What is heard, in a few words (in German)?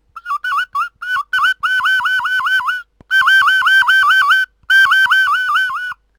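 Blockflöte Kopf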